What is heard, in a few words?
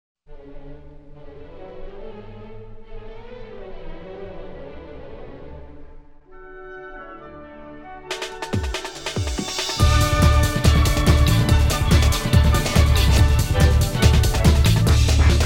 Classical Remix; MTBR Expo